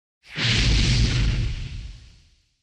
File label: Going up in flames